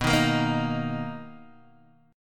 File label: B Major 7th B down Guitar Open D